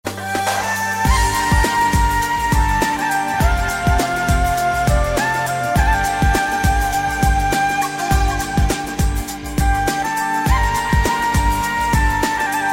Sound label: flute, Flute Mp3 Ringtones